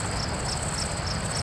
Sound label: cricket; Tony Hawk's Project 8; XBOX